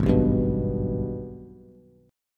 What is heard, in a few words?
Ab Minor Major 7th, down, Bass; AbmM7; strummed